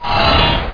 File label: bliz fire; Hexen II; crusader